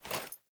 Foley Action Default Sword Throw Wind Up, Foley Default Clothing; Characters; Player